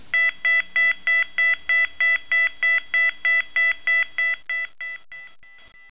Tone Intermittent 660 Hz at 0.28 Hz (150ms On-150ms Off)